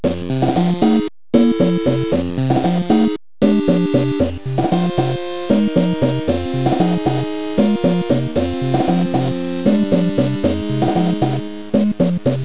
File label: underground